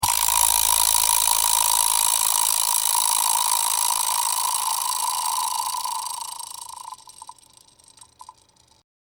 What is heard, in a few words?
Rattle Snake